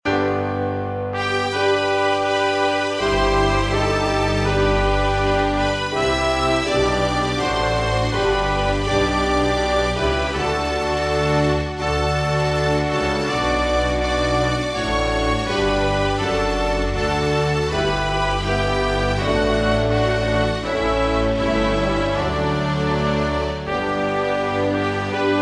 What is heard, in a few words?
anthem